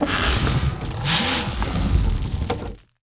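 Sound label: Agricultural Center, complete, buildingCompletion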